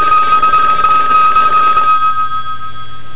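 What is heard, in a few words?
phone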